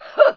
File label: jump; PC Gamer IT CD 41; Daikatana; mikiko